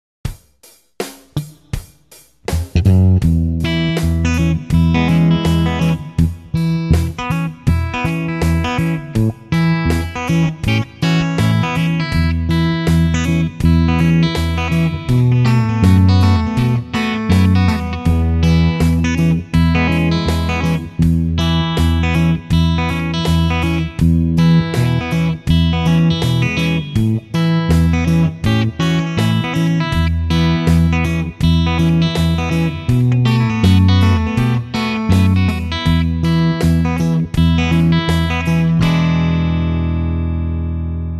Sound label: Rhythm Guitar